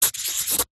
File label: pen sharpie sign signature; office